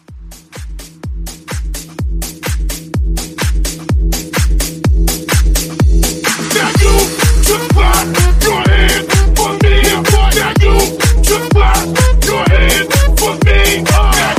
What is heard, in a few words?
House 126; House Scoop Tribute Edit